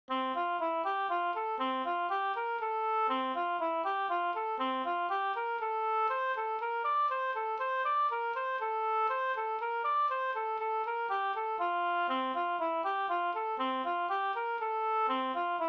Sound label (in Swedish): slangpolska